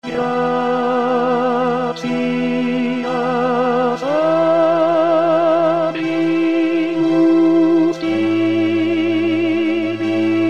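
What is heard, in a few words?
Gratias & Propter Tenor; vivaldi gloria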